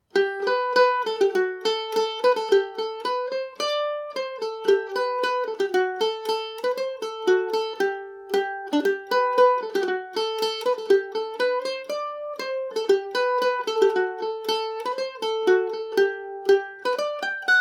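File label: G major, irish mandolin; As I Looked East And I Looked West; G&O #34 mandolin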